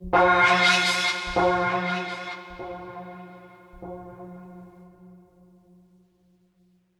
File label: String Mystery; Inquisitor 3